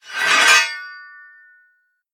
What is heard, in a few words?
pull sword out